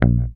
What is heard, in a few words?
HOFNER MUTE, Bass